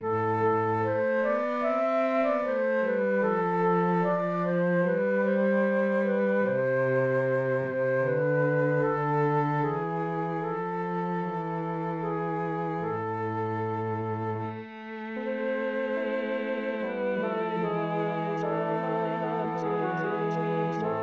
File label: soprano, baroque; weep; 2022 baroque